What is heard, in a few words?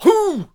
Hwacha Attack Vocals